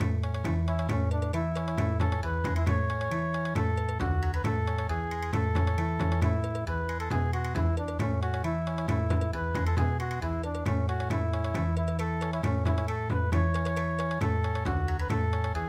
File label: Bon Odori